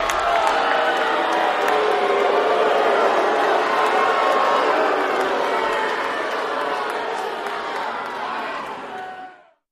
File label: Crowd Boos, Yeahs During Fight